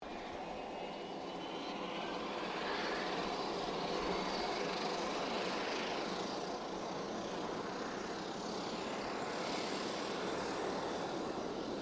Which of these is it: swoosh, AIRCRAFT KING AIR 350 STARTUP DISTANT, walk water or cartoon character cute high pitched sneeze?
AIRCRAFT KING AIR 350 STARTUP DISTANT